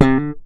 Synth one shot Slap Gm, Bass; Slate Digital BASSHOLE Sample Pack

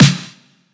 snare monster; Drum One Shots; SD MIDNIGHT Sample Pack; Slate

